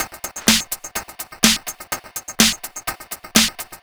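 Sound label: No bass drum, Drum Loops 125bpm, Nu Dance Electro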